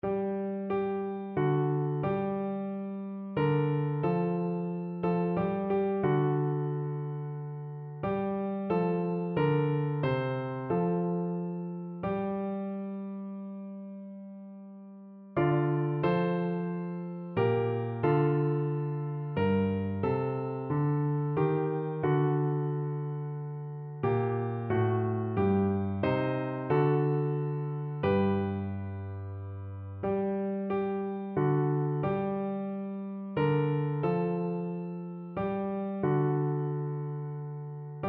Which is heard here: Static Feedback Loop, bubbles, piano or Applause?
piano